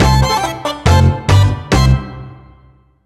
Water Balloon End Tag

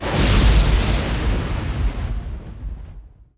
Lightning; luigis mansion dark moon; 3ds switch

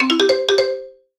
Solo Xylophone Positive

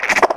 bug; Birthday Party Bash; Wii; Spanish; bug catcher

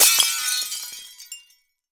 Giant orb break; Blastmorph Pinball; xbox series xs

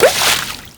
water balloon, balloon pop; birthday party bash; wii; Spanish

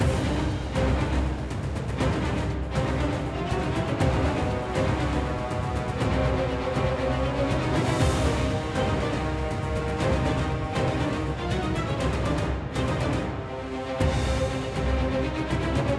Mood Tension Heavy

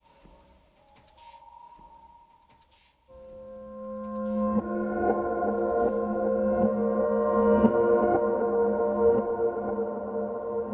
BGM Lobby; Metal Mind

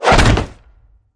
Trap Activate; The Croods

